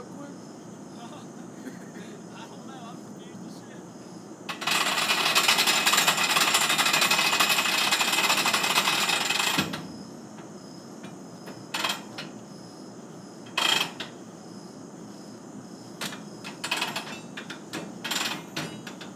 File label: Winch